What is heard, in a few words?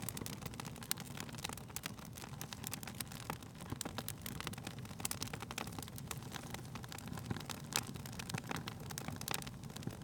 FIre Loop; Don't Be Afraid The First Toy